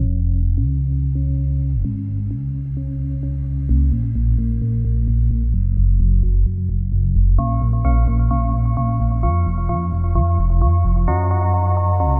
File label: Trance